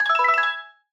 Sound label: click fx tonal; soundscrate